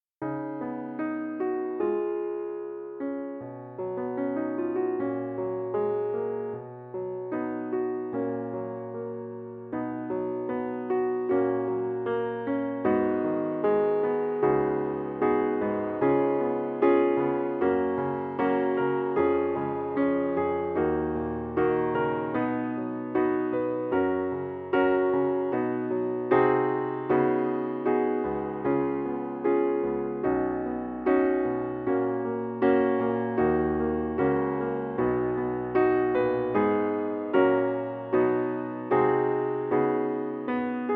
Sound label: Accomp; FOLK